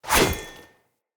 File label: Player Short Sword Throw; Weapons; Swords